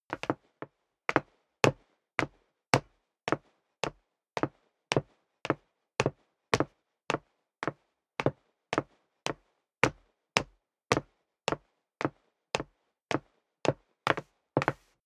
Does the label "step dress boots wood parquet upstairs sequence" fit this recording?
Yes